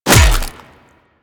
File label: Melee Hammer Impact Flesh